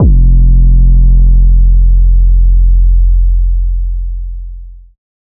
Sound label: 808 F; Cymatics